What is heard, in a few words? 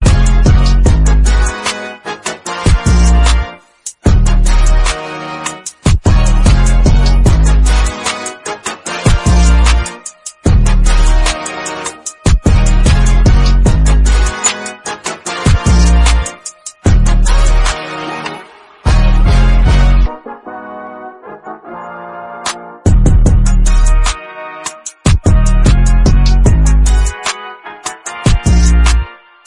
Instrumental Ringtone